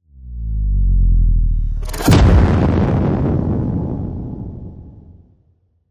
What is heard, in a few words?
Distorted Rise Hit